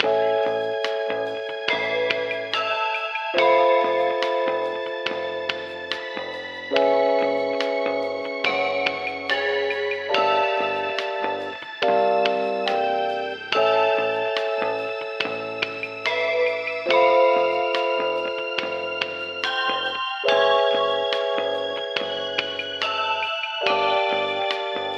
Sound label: elevator music; Angry Goy II